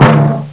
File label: weapon